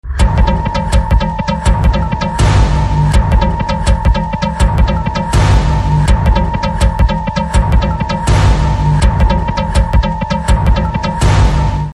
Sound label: Movie Trailer BGM Ringtone